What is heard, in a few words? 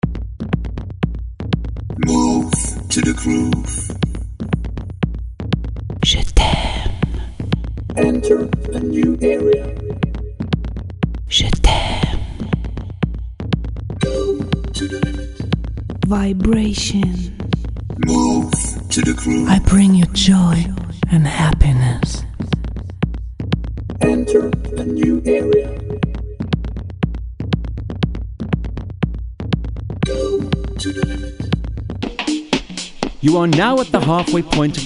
Vocal Attack